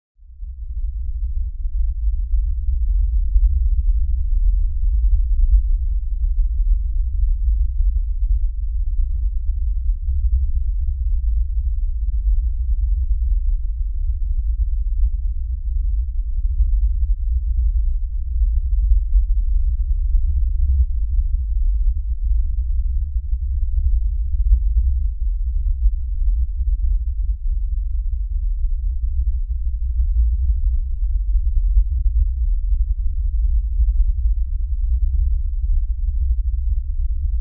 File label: LFE Hull of an Alien Ship Drone with LFE